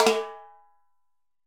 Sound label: Timbales H Flam, Latin Percussion; Drum Hits; Ableton; Factory Packs